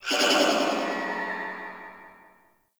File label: Distant Metal Ronks; Ambience; Warframe; Corpus Railjack